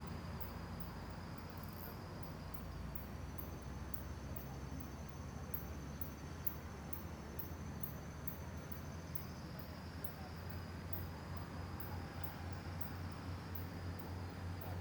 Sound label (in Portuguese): Estrada a noite moto passando cigarra morcego e pessoas conversando longe; transito; Colecao Sonora do Cerrado